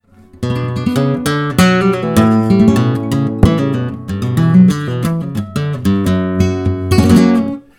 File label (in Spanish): falseta